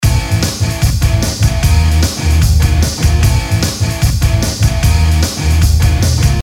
Loop - Retro Rock; Best Of N2O Library

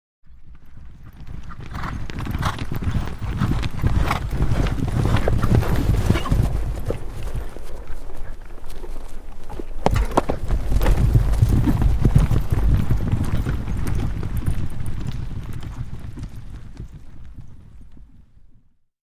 5 Horses Canter Up Stop and A; Historical; Hollywood Edge Historical Series; War Horses Armies & Weapons

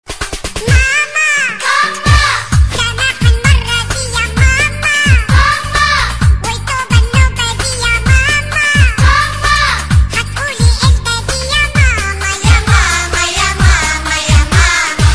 Funny Baby Ringtone

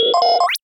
tones

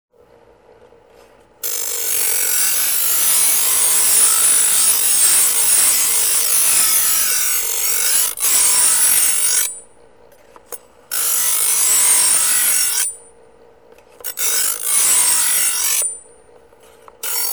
Electric grinding wheel; SOUND EFFECTS1

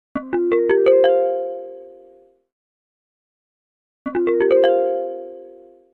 Music logo - Synthesized marimba x2; Hollywood Edge Sound Designers Tool Kit

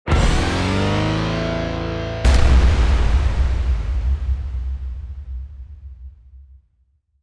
String It Up; DJ sounds

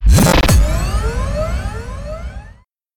impact

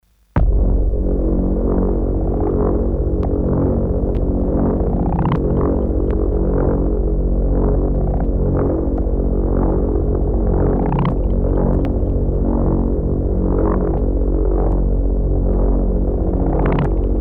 Big Droner, Drones; Best Of N2O Library; Drones & Foundations

